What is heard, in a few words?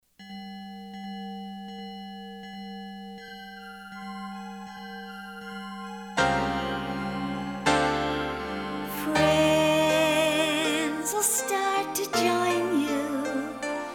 Sing A Little Sing-Along Song, Children Songs